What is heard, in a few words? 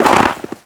foot snow deep, footsteps; Call of Duty Modern Warfare